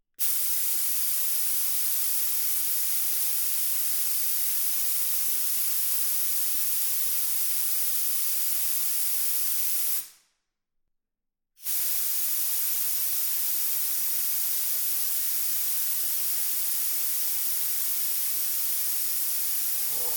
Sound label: Compressed Air Blower On Run Air Release Sustained Off; Pole Position; The Ironwork Machinery Library